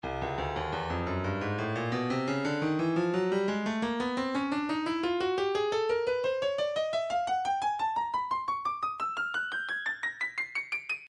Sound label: breakbeat 087 bpm; Stylus Breakbeat Midi Files 085 089 Bpm; Frenutic A